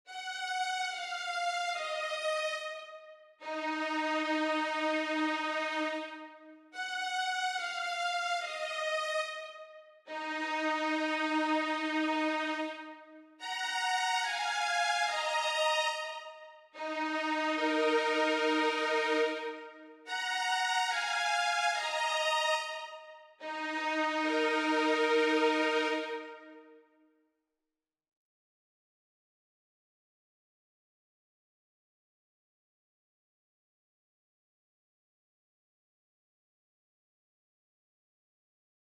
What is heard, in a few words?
144 BPM D# Min Violin, Drill; Loop Stems; Cymatics; 2022 Melody Collection; Road Symphony